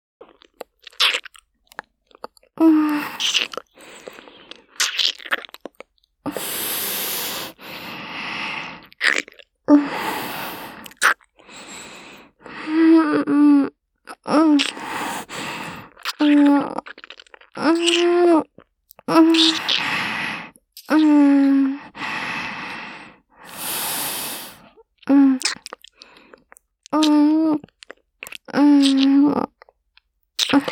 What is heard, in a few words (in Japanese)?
くちびる合わせ; キス